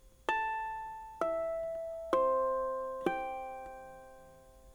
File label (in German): flageolett, ukulele; pono